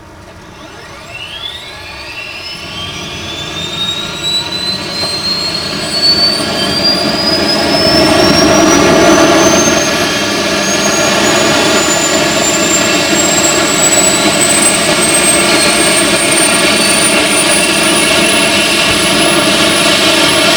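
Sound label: turbine start out right; ec145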